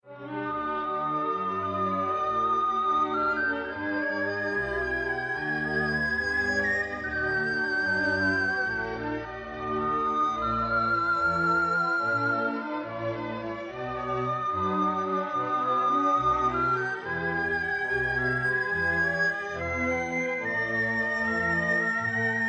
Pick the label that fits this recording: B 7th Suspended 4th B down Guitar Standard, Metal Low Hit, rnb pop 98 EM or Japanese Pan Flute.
Japanese Pan Flute